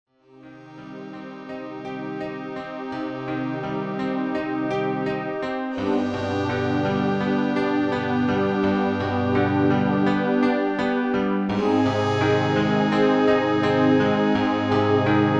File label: Meditation Music